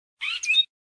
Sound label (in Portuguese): canto bicudo; Preparação Gam Tuí